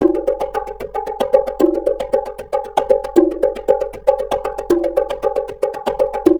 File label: drum shift